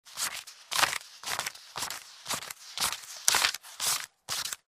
Counting paper money

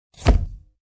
Wet Plate Camera Shutter

